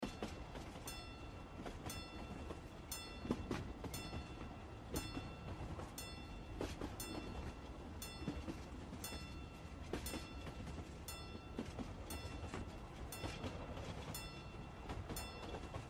rain sounds rainy journey on a train

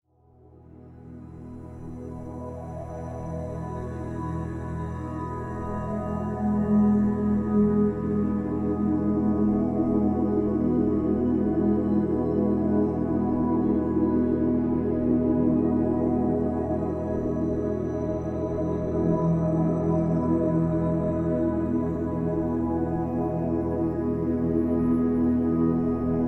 deep sleep

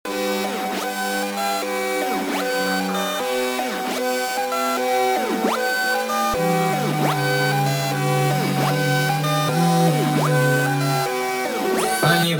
sped up; russian

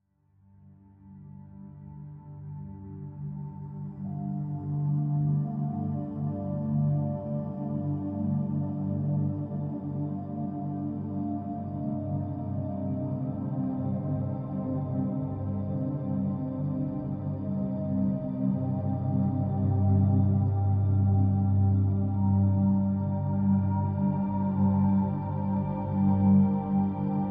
binaural beats sleeping music alpha brain waves

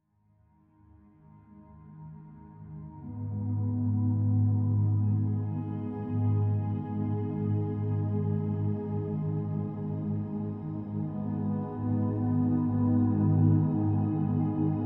binaural beats sleep alpha waves

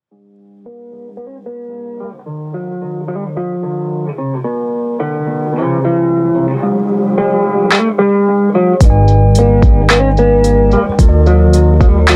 lofi chill fruits music

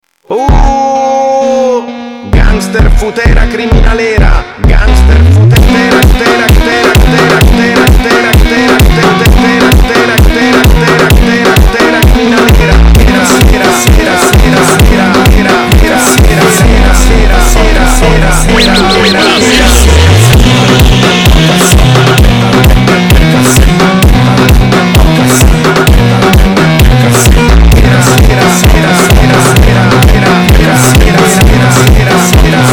gangster footera criminalera funk

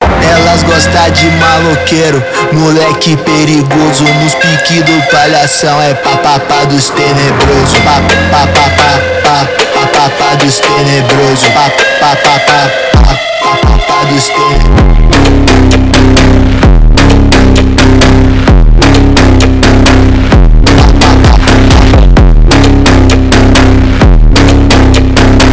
brazilian phonk

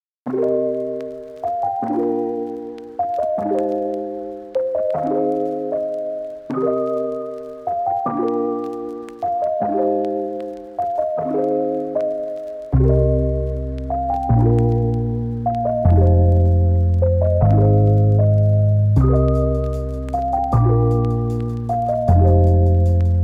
lofi hip hop beats lo-fi beats chill vibes

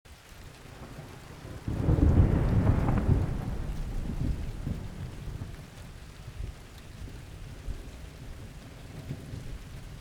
thunderstorm

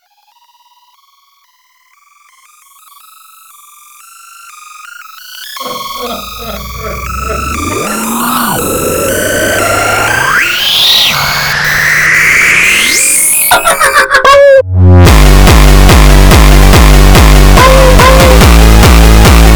hardstyle

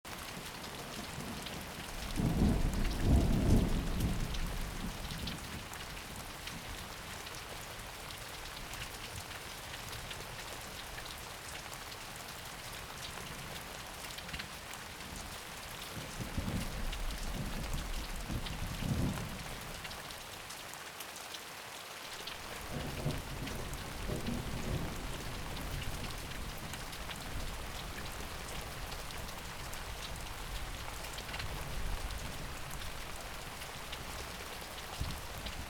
thunderstorm

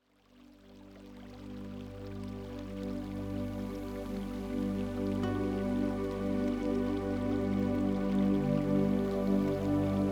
spa

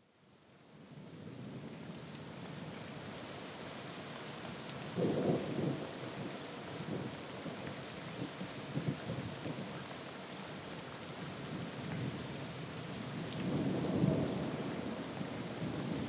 thunderstorm

